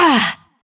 Grunt; Lewd SFX

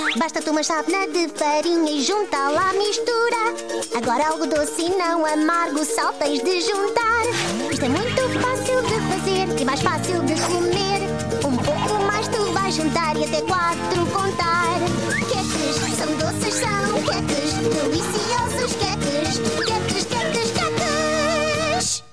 Cupcakes (European Portuguese); Season 1

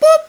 VOX Boop; 160 BPM

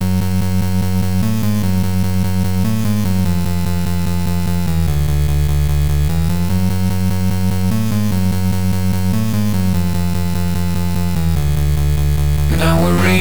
Chiptune Cover; Pony Music Archive 22.10.01

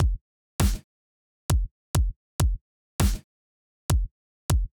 Drums, 100BPM

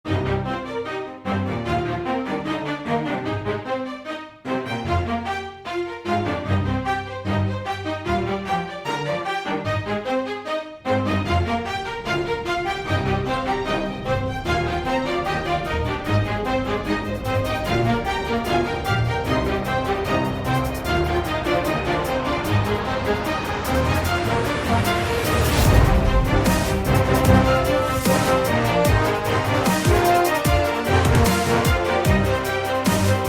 Orchestral Dubstep

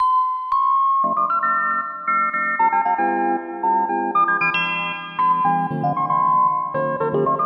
bells; Pony Fan Music Remix Stems

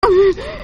Moan

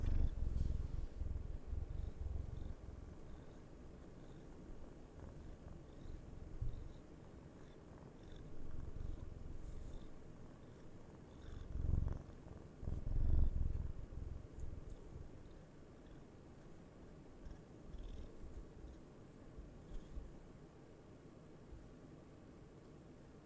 My cats purrs

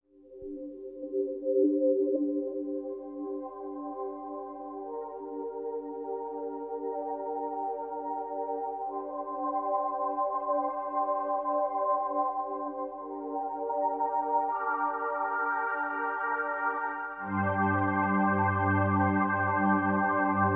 emergency techno; Remixes

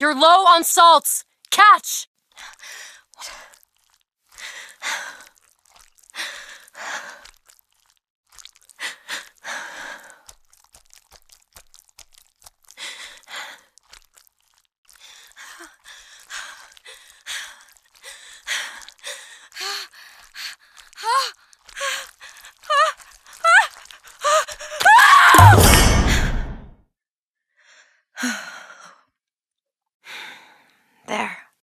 Sex; Dialogue; Lewd SFX

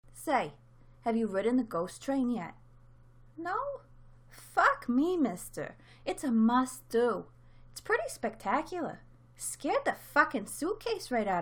I laugh; In which I laugh at my terrible acting

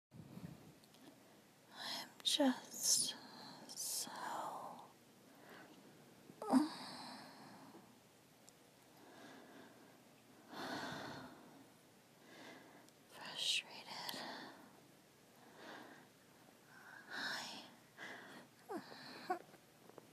Bedtime Noises, desperate, whimpers

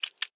Sex Slide

